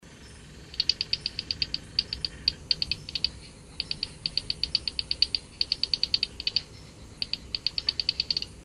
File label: Gephyromantis rivicola